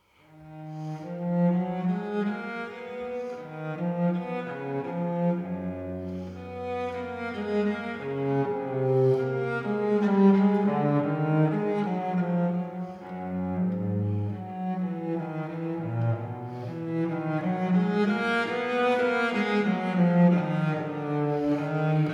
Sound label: Cello mix; Cello 6 mix; Flying Tonstudio; Tonaufnahmen vor Ort